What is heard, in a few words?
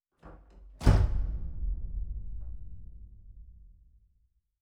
door lose